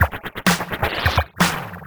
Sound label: VFX2 Loop 128 BPM